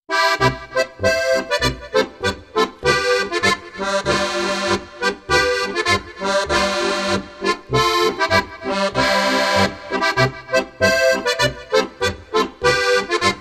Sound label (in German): polka; Leicht und Zünftig - Folge 4